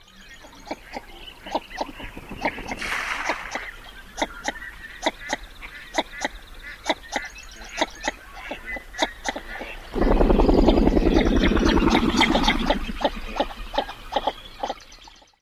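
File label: male chux and chux calls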